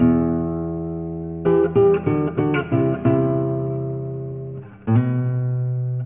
guitar, Jazzmatazz loops

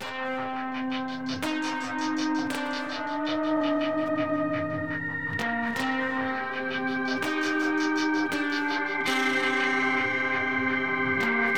guitar, Sad

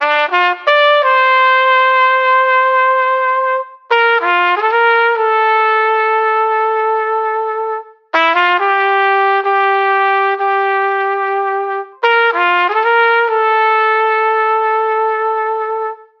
horns sad fanfare; Loops

